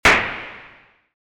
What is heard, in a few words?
Perc; PatchFactory